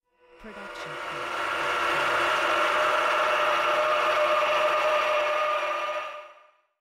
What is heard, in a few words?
Screamy Screetchy; soundscrate